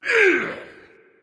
grunt; smite; Vulcan

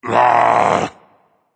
ugh berserk; cuchulainn

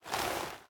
armor; smite; chaac

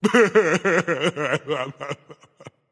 boo hoo; smite

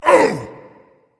ouch berserker; cuchulainn

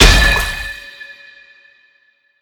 waterball trident impact; smite; poseidon

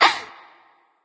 hehheh; smite; sol

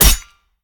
stab hit; smite; lancelot

